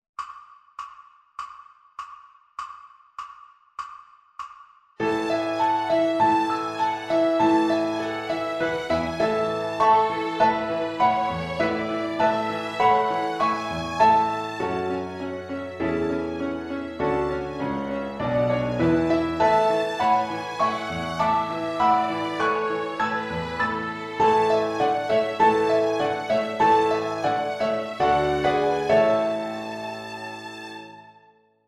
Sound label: Violin Piano Backing Track; ViolinSchool